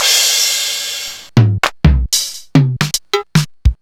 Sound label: mxr; m8